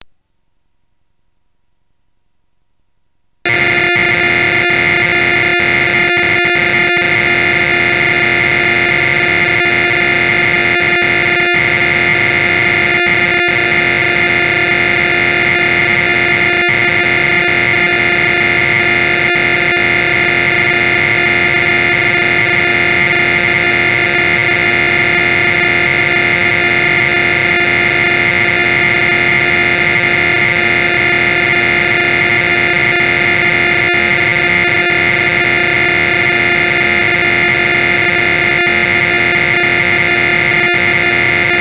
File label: PPG Wave 23 factory presets; Synthesizer Factory Patches; Cassette Interface